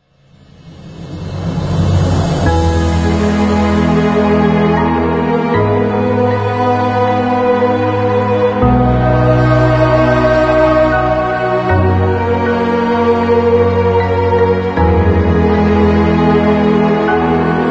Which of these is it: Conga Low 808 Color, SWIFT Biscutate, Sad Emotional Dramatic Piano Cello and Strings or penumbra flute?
Sad Emotional Dramatic Piano Cello and Strings